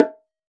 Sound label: Bongo Low, Roland BK-5; Percussion